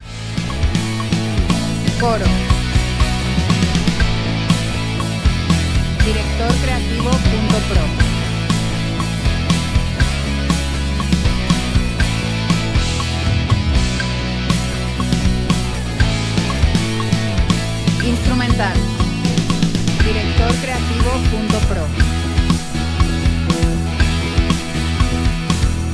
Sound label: 120bpm E